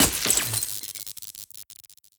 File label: Props Neon Sign Broken